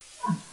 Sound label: snort